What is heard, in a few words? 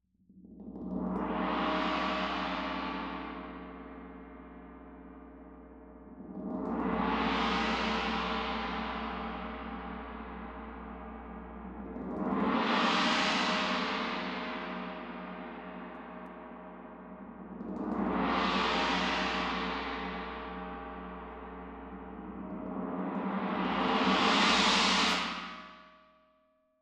Tam-Tam Close